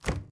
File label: Morgue door open; Phasmophobia